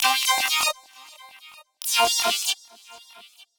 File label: 132bpm Lines n Loops Synths, uk garage samples